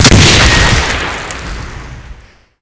grenade; ModernWarfare

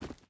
footstep boots forest run; Rust

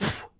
Blow Musket; Civ4 warlords

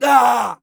scav death, voice; Escape from Tarkov; scav2

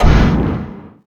polycraft burst end

